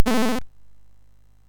Dragons Den, MOS6581R3

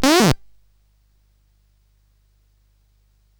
Shuffle, CSG8580R5

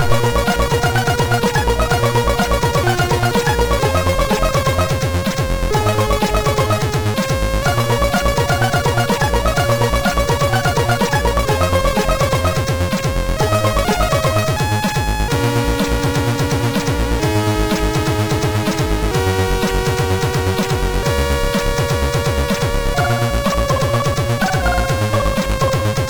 Telephone, MOS6581R2

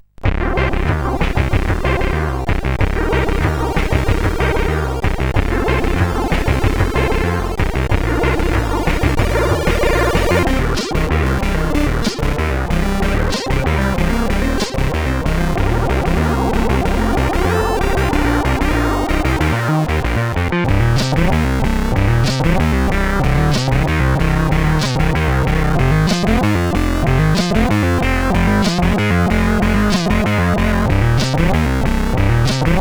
Rock, CSG8580R5

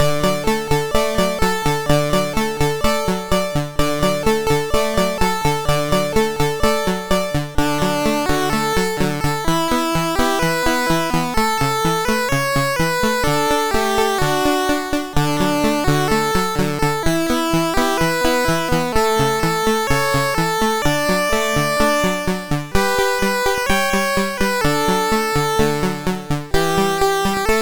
Alex Kid Remix, MOS6581R3

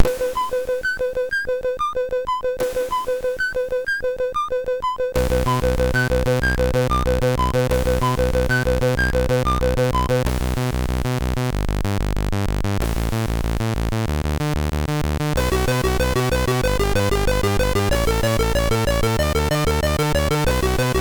intro sid MOS6581R3

